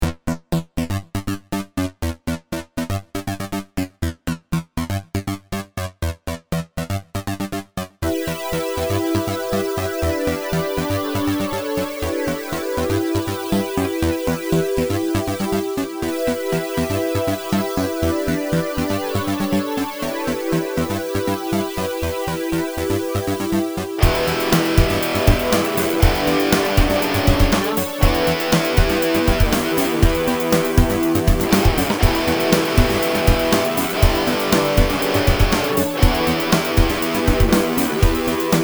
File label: Ultimate 80s (With guitar!)